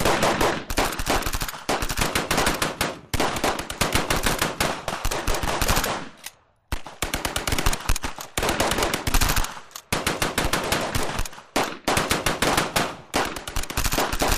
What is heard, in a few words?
FIREFIGHT, modern